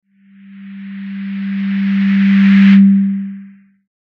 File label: Hum Hum Hiss Quick Hum, buzz